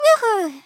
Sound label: Hoo Hoo; Teto; SM64 CO-OP; Triple Baka Pack